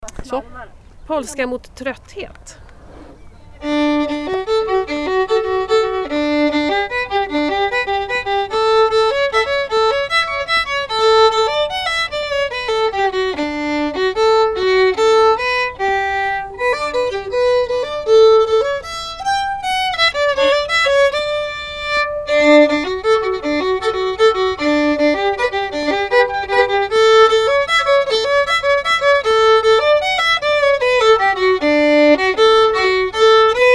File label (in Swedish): Polska mot trötthet